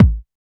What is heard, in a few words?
Kick, Electro Kit